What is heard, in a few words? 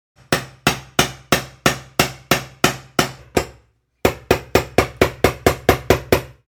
Worksite ambience hammering noise